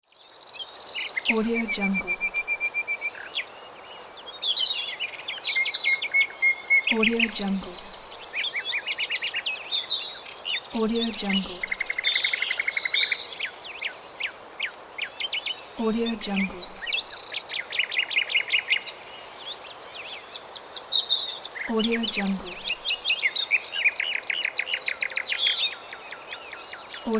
nature

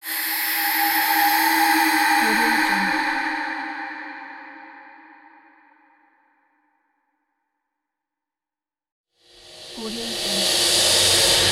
dark breath; umcsound

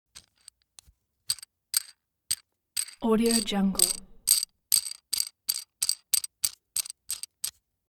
object metal screw nut sequence long

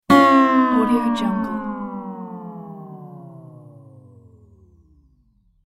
game show loser

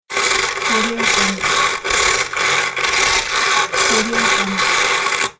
wire brush scraping wood; electronplanet

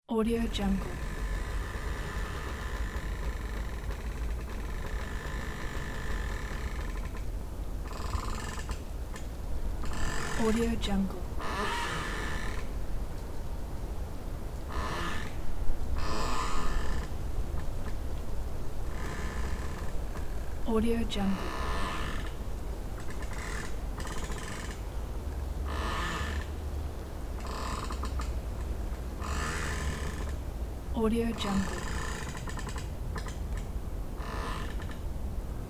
creaking tree and wind

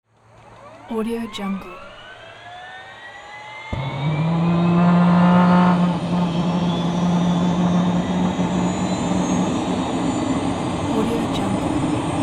helicopter starts up and takes off; hollywoodedge

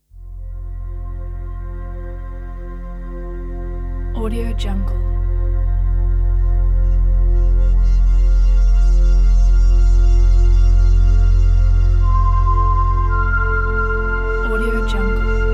spiritual reverence

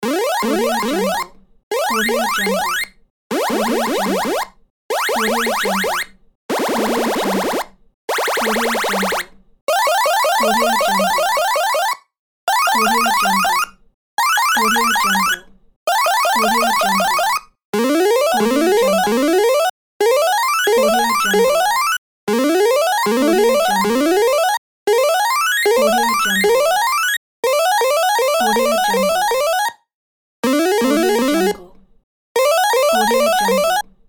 8bit point counter mega pack

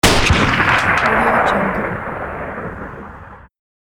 sniper shot

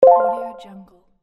confirm button

